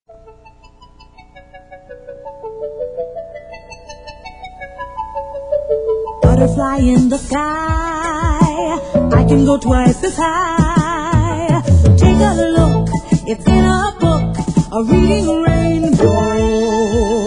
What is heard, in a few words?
Chime